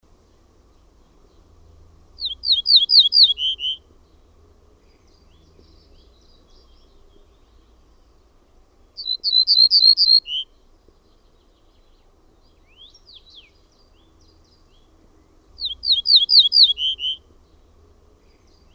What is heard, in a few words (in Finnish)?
PELTOSIRKKU; LINTUAANITYKSET